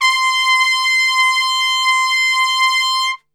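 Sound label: C 4 piccolo trumpet, Brass; Best Service; Brass Super Section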